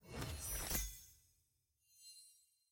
sfx collection unlock highlight; tftset16

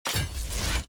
sfx tier wings promotion from unranked

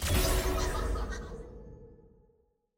hub tracker open

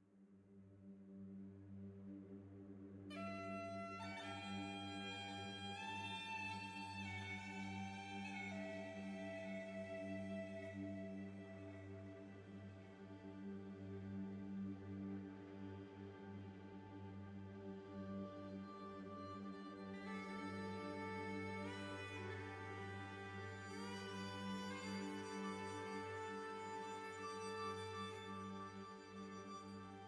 ambient

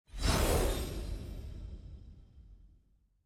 lobby strawberry page load

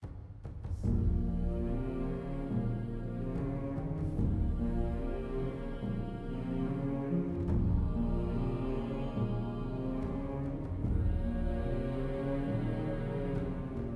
event negative

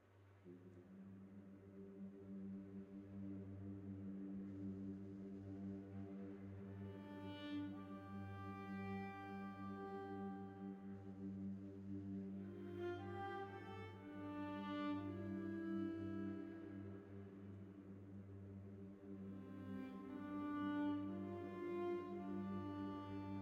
mus ambient

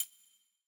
lobby button map click

